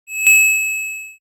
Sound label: shy, ringtones; standard ringtones